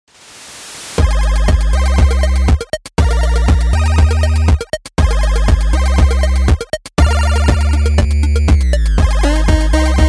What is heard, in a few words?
8-Bit